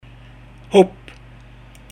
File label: jump